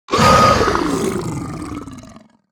Emberscale Drake Play

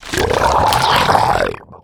Humongus Raptor Leaf Play

